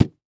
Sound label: Impact Body Hit